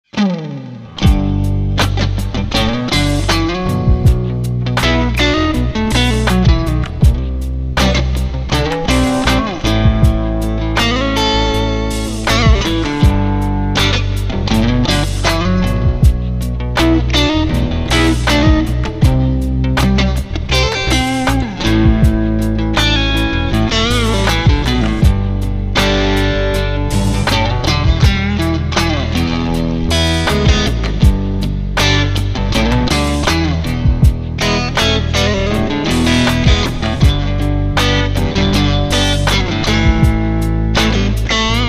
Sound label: Clean Strat